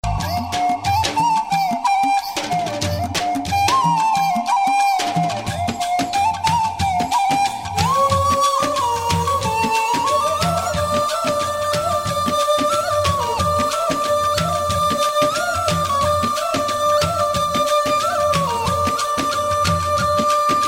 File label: Music